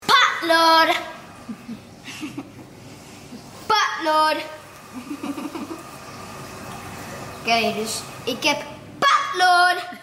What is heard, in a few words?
fart party